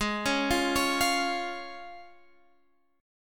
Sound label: F Minor Sharp 5th G sharp